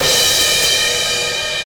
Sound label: crash sound b key